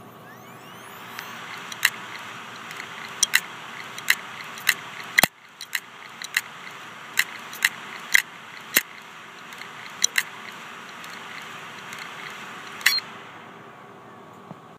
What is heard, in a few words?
HGST 320 GB Dead Heads